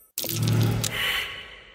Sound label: insight fail